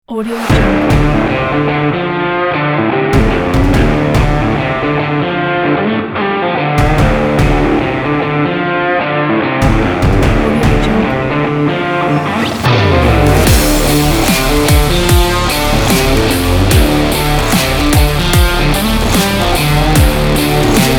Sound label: intro energetic sport action rock